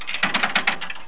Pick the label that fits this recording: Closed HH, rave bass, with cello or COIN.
COIN